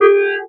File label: Multimedia Internet CD-Rom Flash Hi-Tech